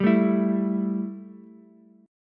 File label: E Minor Double Flat 5th E Ukulele; Embb5 strummed; x,7,5,7